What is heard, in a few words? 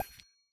correct